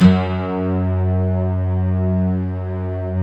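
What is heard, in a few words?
bright guitar strings, Slow Bright Guitar Strings; Optical Media International - Sonic Images Library; Eastwind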